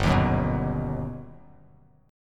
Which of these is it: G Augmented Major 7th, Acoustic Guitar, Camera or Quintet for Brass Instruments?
G Augmented Major 7th